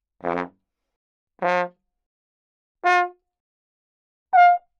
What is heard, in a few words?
Horn; 気鳴楽器; LipReed